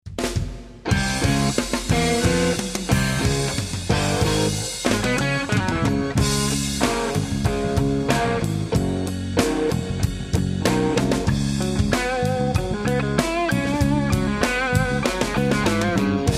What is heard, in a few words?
Sample Les Paul